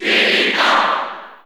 Cheer English; Diddy Kong; SSB4; SSBU

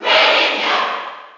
Cheer; Greninja; SSB4

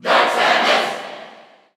Cheer English; Dark Samus; SSBU

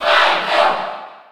Cheer French; SSB4

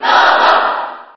Robot Cheer JP; SSB4

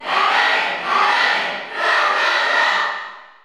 Cheer Spanish; Robin; SSBU

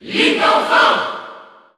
Cheer French; Young Link; SSBU

